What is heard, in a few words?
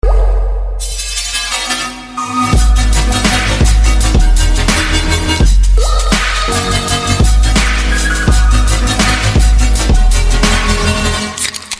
echo samsung ringtone